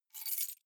key ring grab stone; objekte; schluessel

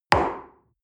coffee place table medium short

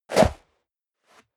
step leather shoes sand jump

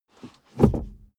suv release reverse gear bounce; verkehr transport; autos

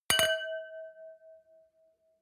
wine glass similar clink bounce hard; berufe; gastronomie; soundpool

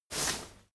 crowd movement single bright; bewegung; soundpool

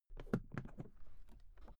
briefcase open; industrie wirtschaft; bueroarbeit

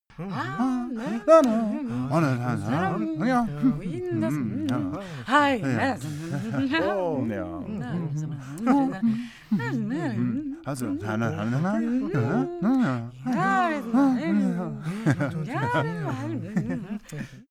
crowd happy walla

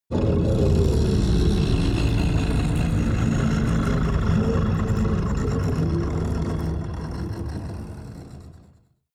crumble soundscape high; drones

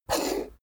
poker chip flick slide table long; chips

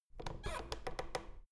door plastic handle pull down creak soft

